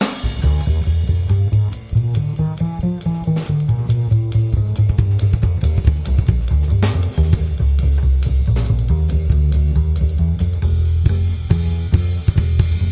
Basic Jazz

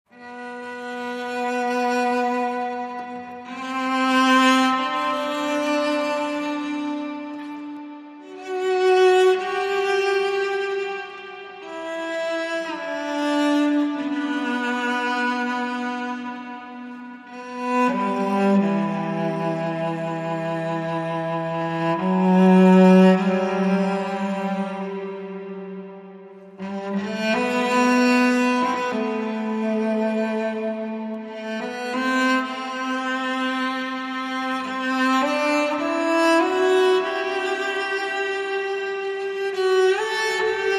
Lead Cello Only

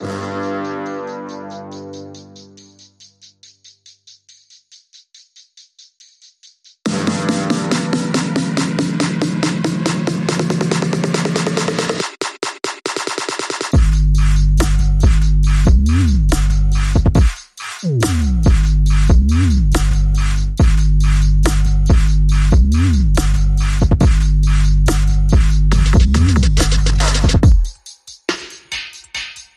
Drums and Braams Only

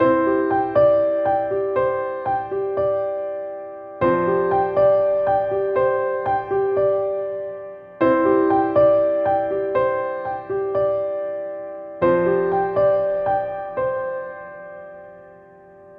Bright And Open

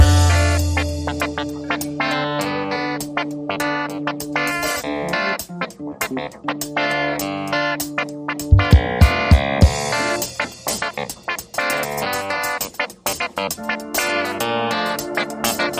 Funky Clavinet Keyboard; 60sec

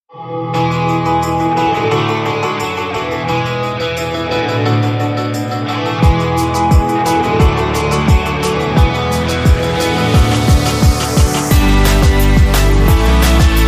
Piano Fantasy, Short

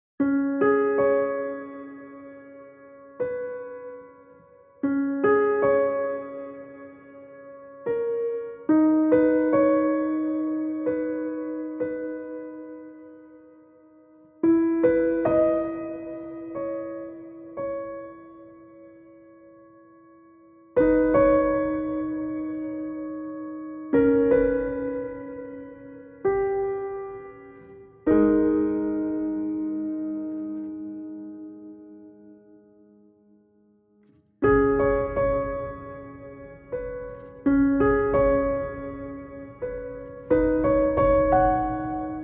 Piano

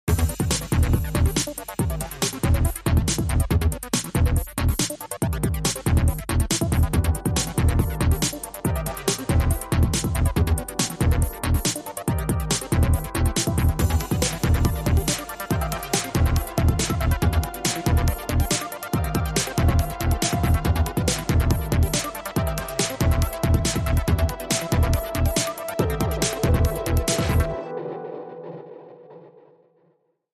8 Bit

